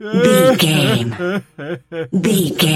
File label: voice male cry low